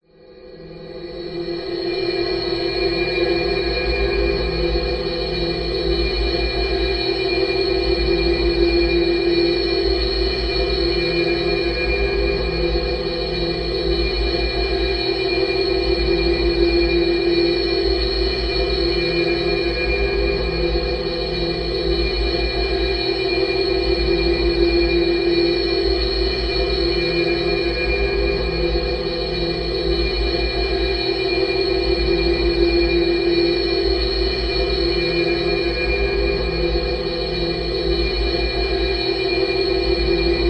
science fiction atmosphere spacious airy shimmering droning pan mysterious; zapsplat